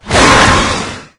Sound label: attack, maggot; doom3